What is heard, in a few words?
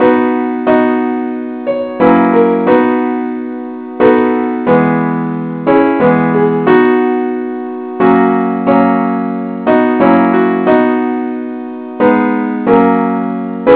simple acc key b; i need thee